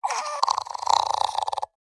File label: Enemy Poult Idle